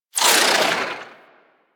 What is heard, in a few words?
Rifle Projectile Tail; Weapons; Warframe; Corpus; Crp Ruban Rifle